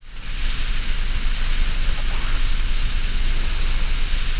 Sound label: PINK NOISE, VOCAL WIND; ILIO; Vocal Planet; Jazz & FX